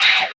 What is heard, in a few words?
Trap Fx; Sonic Foundry; Esoterik Beatz